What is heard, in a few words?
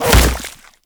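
Regurgitate Projectile Impact C; Warframe; Grendel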